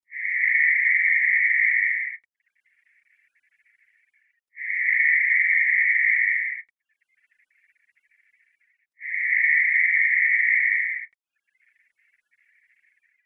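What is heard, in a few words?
phase antiphase; Magnitola Avtozvuk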